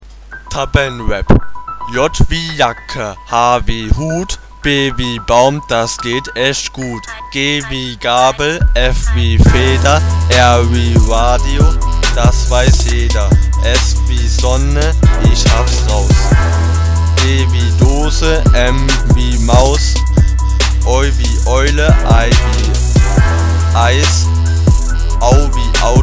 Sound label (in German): Tabellen Rap